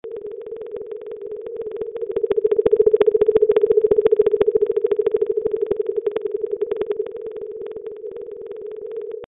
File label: atl minke, seasounds